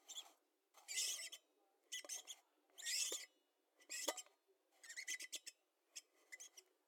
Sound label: Bottle Squeak Sound